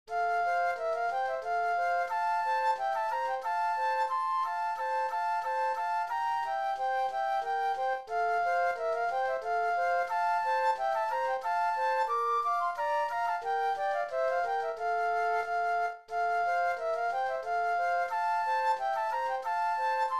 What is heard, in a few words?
Polonaise Flute Duet; classical; Mozart